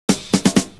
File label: Fill Ins 128BPM; Loops; Vengeance Samples